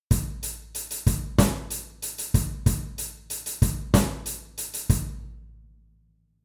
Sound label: snare